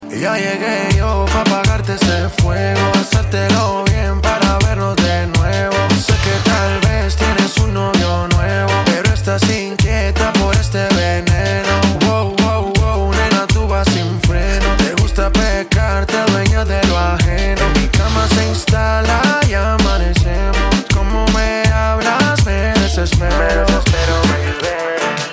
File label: ambiente ringtone